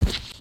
soft, human step

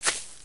grass, human step

